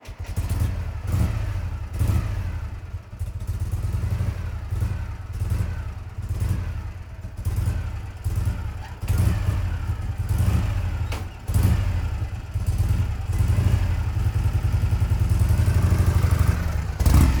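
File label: zvuki motocikla, motorcycle sounds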